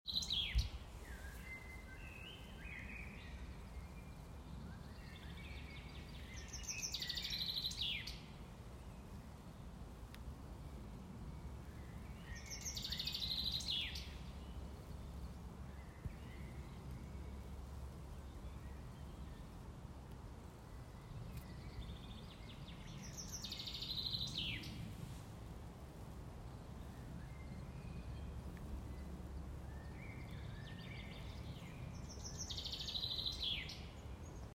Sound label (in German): Buchfink